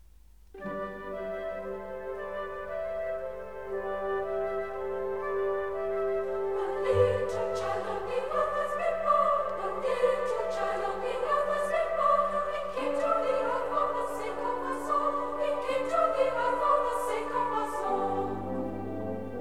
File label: flemish carol; flemish traditional trans. trevelyan